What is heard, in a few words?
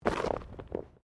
Body movement Slide Gravel; Body movement foley to simulate falls and deaths; Bodies (Sound Effects:Human)